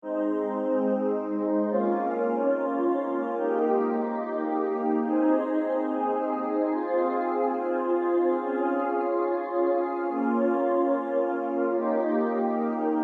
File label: Rhythm Emotion Pad